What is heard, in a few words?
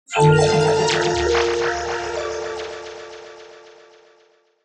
melodic fx digital doodad Bb Maj; base pack